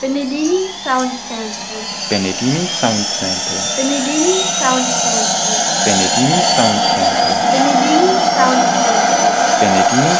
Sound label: EC135